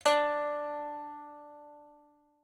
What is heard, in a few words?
pipa